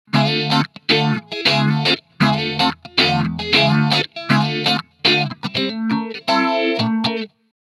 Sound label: funk rhythm wet